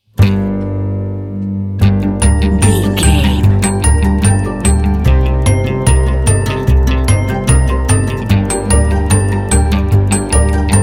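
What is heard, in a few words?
polka crazy fun hour no drums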